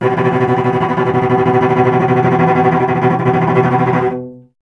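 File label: strings cello B; Miroslav Vitous; Solo Instruments VOL-1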